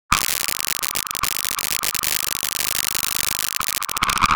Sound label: screaming